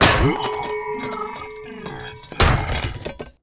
STONEKNIGHT WAR DIE

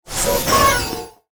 RECRUIT SKILL; BARAS; Mob

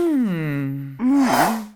Soul Cinder War idle

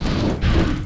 WAR WALK; SIZZLER; Mob

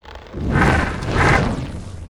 WAR ATTACK; GRAVELAPIS

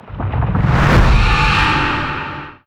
HOLY STONE KEEPER war attack

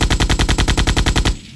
auto gun

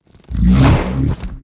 WAR ATTACK

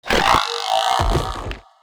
die; Mob

